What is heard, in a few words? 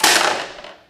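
physics clipboard impact medium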